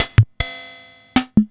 Yamaha VSS-100

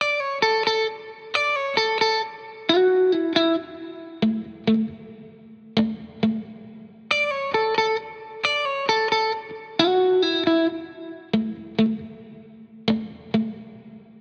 Guitar Bpm135 Key D, 70s Guitars

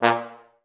TB STAC A#1; Best Service; Peter Siedlaczek's Advanced Orchestra

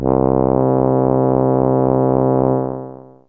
Tromm1 C3, Fairlight CMI IIe; Brass1

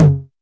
808 & 909 Kit LOW TOM; Roland Juno-D

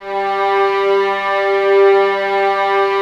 Violins G 3, String Ensembles; Miroslav Vitous; LINKS ORCH

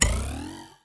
Ruler Twang, cartoon Foley Fx; musicradar; cartoon caper

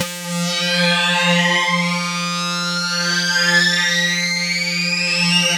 SYN, 160 BPM, Drum & Bass

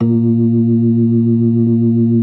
B3 A#3; Best Service; Hyper Dance

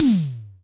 HOUSE DRUM; AKAI S6000 CD-ROM - Volume 1; DRUM RYTHM

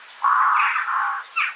Birds Various, Birds

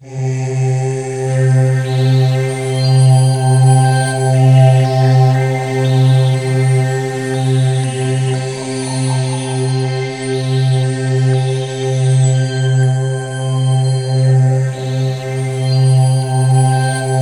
SynthLine C3; 90 s Sample CDs; NiceBeats; SynthLine VOL-15

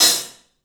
HAT, CLUBHAT, 3D Drums; 3D Sound

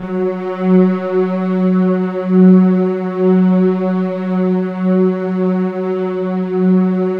Keypad; Standard Instr; Keypad 2

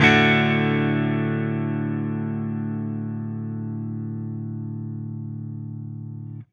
Silos Clean Strum Guitar Ending, Indie Rock; Sonic Foundry (Sony Creative Software); Indie Rock Anthems; Session 11 - Silos

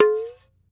TABLA HAMM U2, Indian perc; Spectrasonics - Heart of Asia CD2

